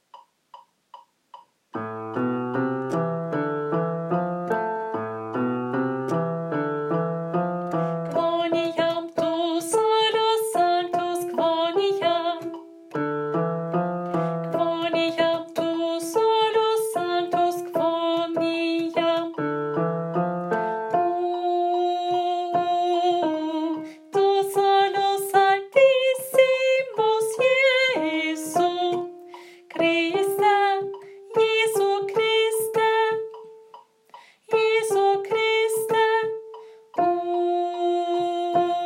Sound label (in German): Gloria, Alt II; Alt II (Teil 3); Messe; Chor